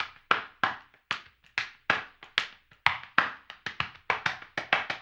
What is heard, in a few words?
HAMBONE, Vocal; Blues & Soul